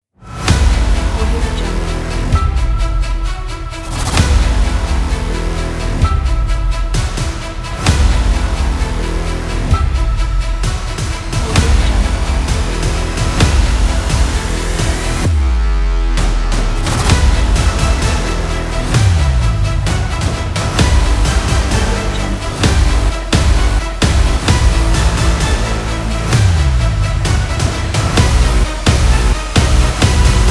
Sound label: energetic trailer